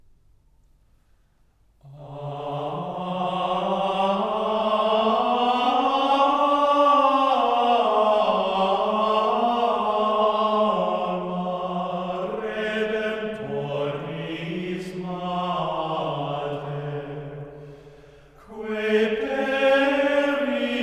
Alma redemptoris mater (solemn mode), antiphon in mode 5; The Chants of Mary